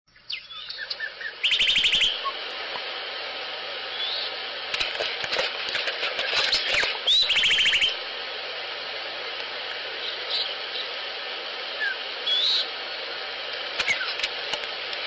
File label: Sinaloa Wren; Baxter